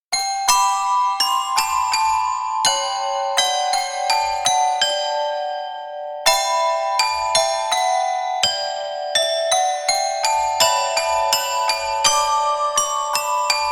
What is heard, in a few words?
handbell kizoku shiro